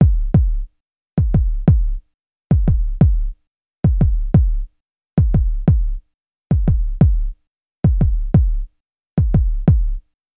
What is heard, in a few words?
Hip Hop Kick, Drums; Pro Tools Essential Loops; Digidesign